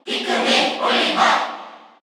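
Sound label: Cheer Korean, crowd; Olimar; SSBU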